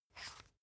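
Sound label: toothpaste close twist, Bathroom; haus-garten; badezimmer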